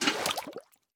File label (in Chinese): 美西螈装入桶声